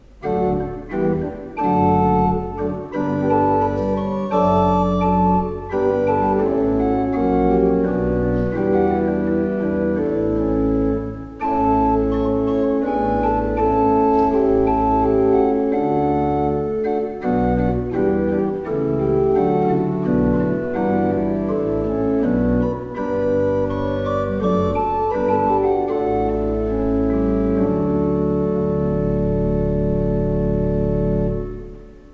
Fleiter, pipe organ

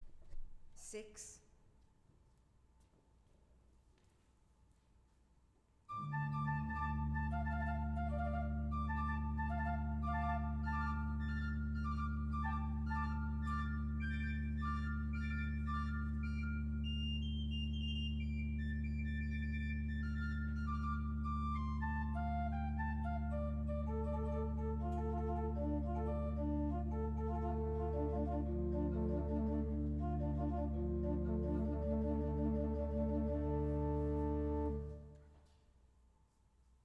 pipe organs; Weigle

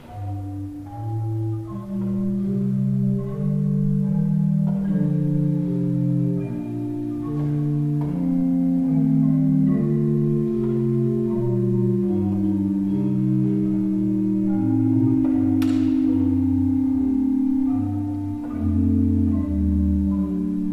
Graf, pipe organ

